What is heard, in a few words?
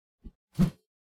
melee attack swoosh heavy; weapon swooshes; psfx